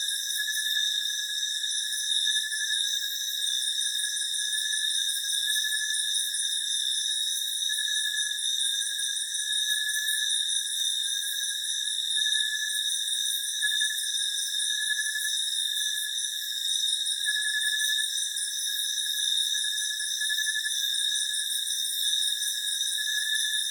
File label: Ambience Banaue Rice Terraces Night Clean; InspectorJ